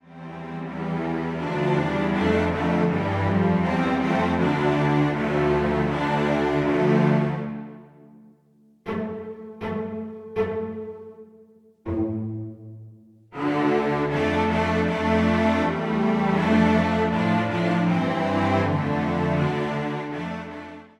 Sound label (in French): 3 violoncelles; Traditionnel; Kis kece lanyom La jeune fille en blanc